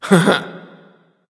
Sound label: heh heh; Smite; bacchus